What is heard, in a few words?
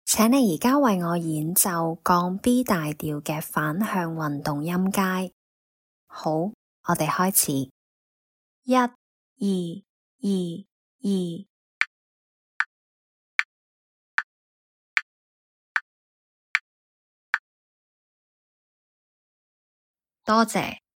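B flat major contrary motion scale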